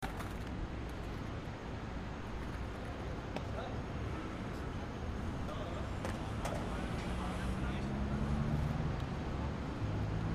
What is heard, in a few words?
skater